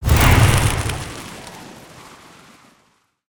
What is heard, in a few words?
Ice Shuriken Throw; Spinjitsu